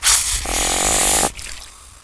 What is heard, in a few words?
hiss, pupae; svencoop